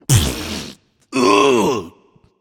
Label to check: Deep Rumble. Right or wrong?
Wrong